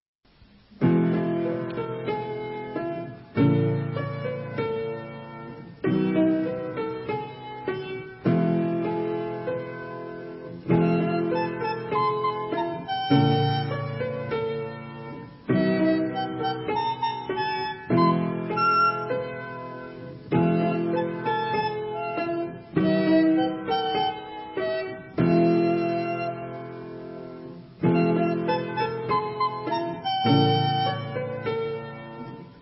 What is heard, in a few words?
instrumental